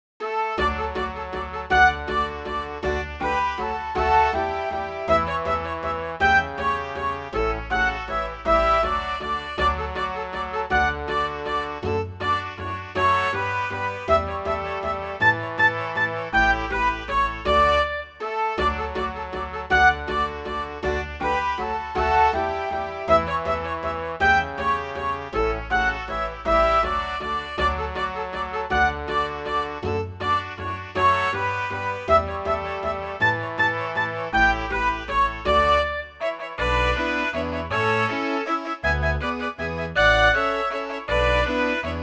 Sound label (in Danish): Svalen vals, MIDI